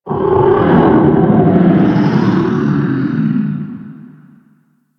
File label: Golden Maw Warning Vocal; Ambience; Warframe; The War Within